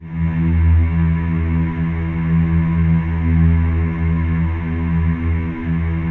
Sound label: E2, CHOIR; Spectrasonics; Symphony of Voices